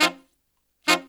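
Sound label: Pop & Funk Brass, 110 F, left; Best Service ProSamples vol.25; AKAI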